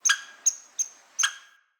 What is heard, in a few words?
Squirrel Trapped Call; Encounters; Animal Rescue